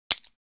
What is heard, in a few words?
draw, weapons; csgo; navaja